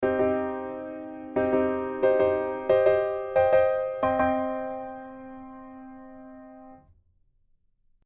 c min c

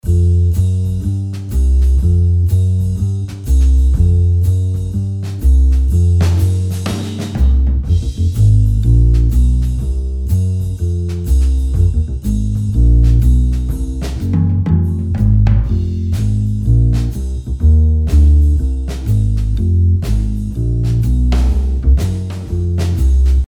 Upright Studio

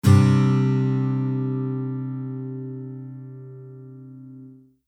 gcsus2

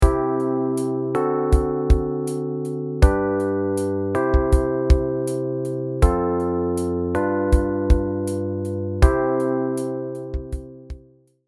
C D7 G C F G7 C

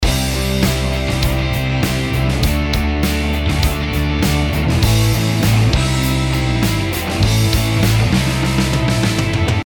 kick

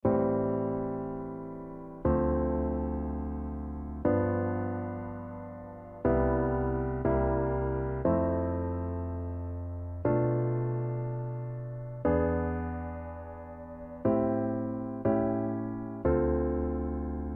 Piano Chord

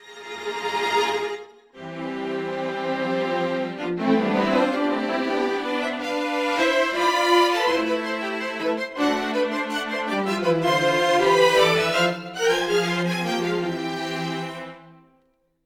Chamber Strings

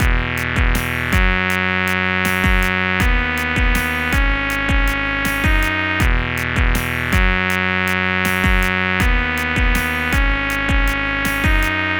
Backing

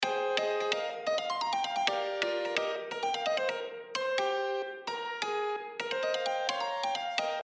piano attack

